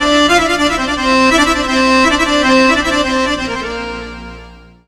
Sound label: SYNTH, ARABIC